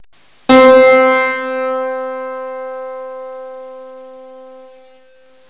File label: Grand Piano